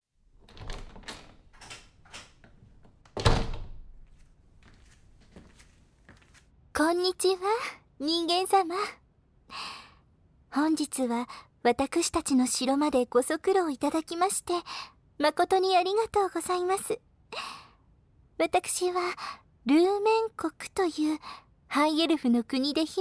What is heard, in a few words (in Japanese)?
ご挨拶耳舐め, ASMR